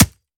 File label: melee world fist soft; viper